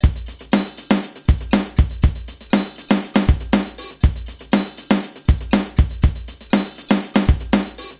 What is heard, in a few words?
loops drum pattern